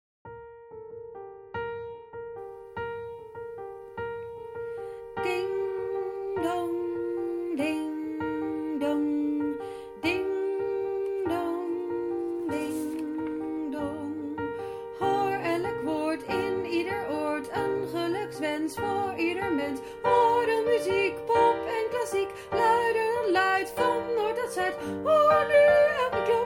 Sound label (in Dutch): Ukranian bell 2e sopraan; Setlist Kerst 2021